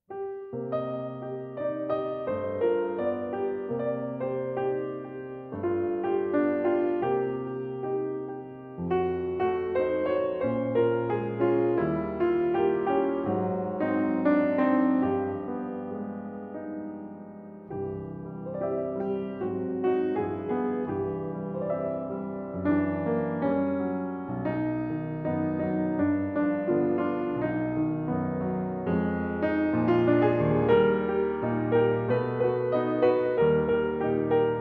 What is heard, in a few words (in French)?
version piano seul